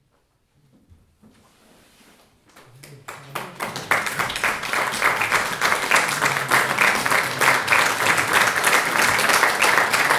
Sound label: Applause; Liederkreis and Wolf 2017